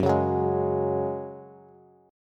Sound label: Gb Suspended 2nd, Bass; strummed; 14,16,16,13